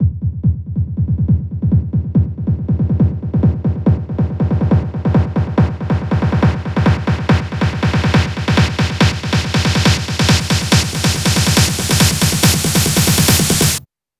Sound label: 140BPM SNARE 8 Bars; Velour; Vengeance Samples; VUF1 Snares&FX Pairs 140BPM